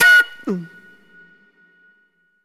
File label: NY 70's Jazz Flute; Session 01 - Benedetta; Sonic Foundry (Sony Creative Software); Manhattan Flutes